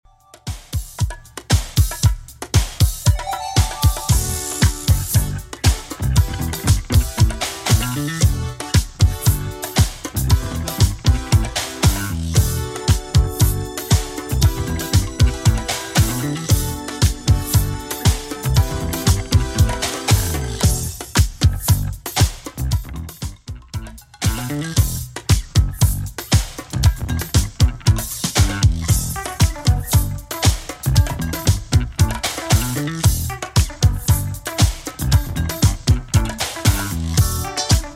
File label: 80s Redrum 116 bpm